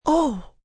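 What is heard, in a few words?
Gasp Exhale female, Gasp, female, exhale; Gasping; Voice Box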